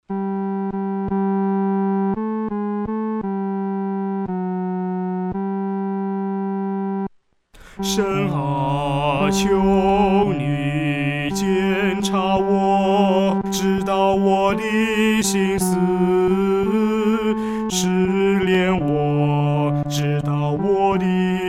独唱（第三声）

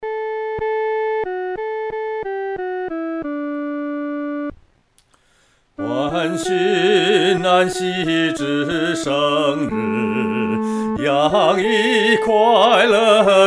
独唱 第一声

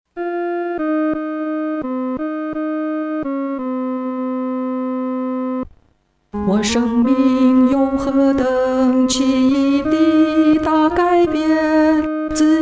独唱 第二声

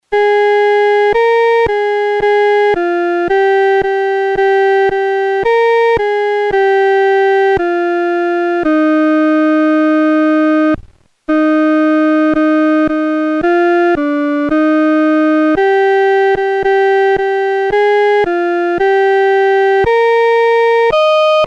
独奏（第一声）

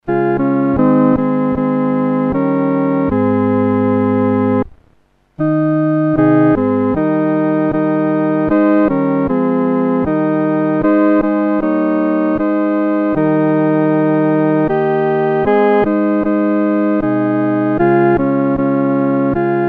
独奏 第二声; 万口欢唱

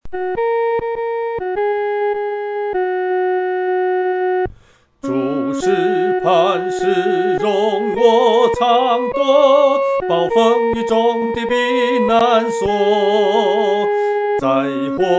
独唱 第一声

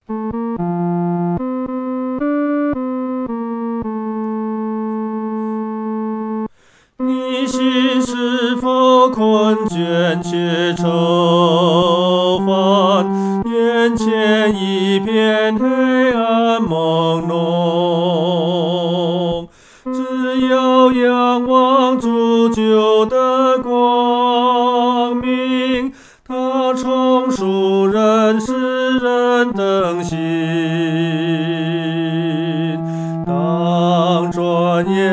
独唱 第三声; 当转眼仰望耶稣

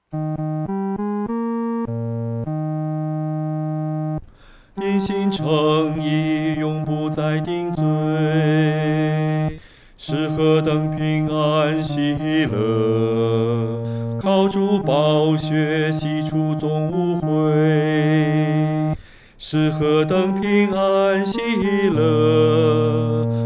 独唱 第四声

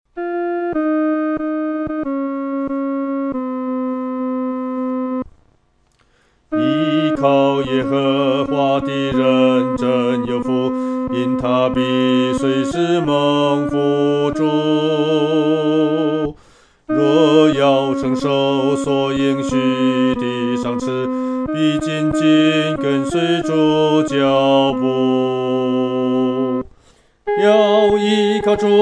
独唱 第二声; 靠主有福

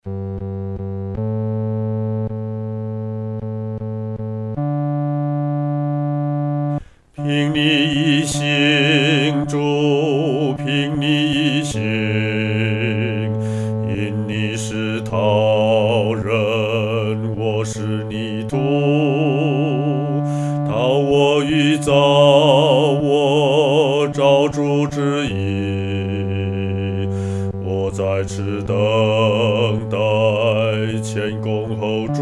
独唱（第四声）; 凭祢意行

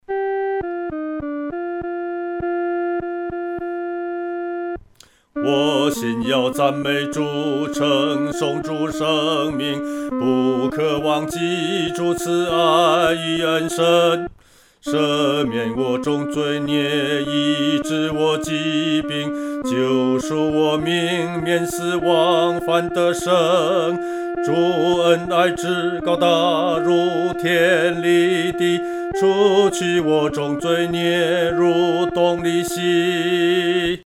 独唱 第二声; 赞美主恩

